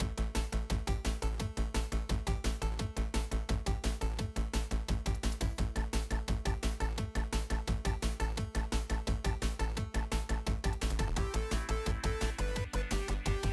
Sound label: music boss; Ben 10 - World Rescue